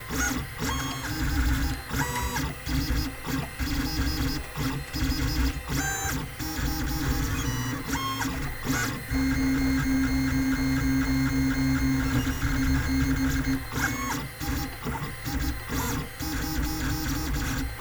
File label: 3D Printer Heavy Servo Sequence, Lulzbot mini; MattLightbound